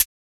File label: Closed hat, techno drum